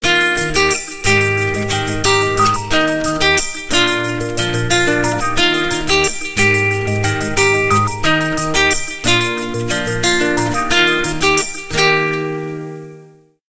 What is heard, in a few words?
Guitar Trip; LG Optimus L3 E400; ringtones